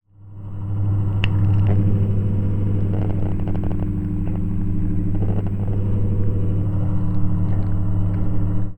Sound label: Fan, contact mic, Mechanical; musicradar; contact mic madness; Raw